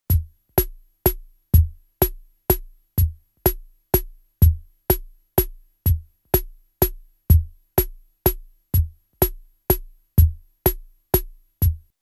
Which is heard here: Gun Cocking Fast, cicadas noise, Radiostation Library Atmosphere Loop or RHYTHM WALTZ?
RHYTHM WALTZ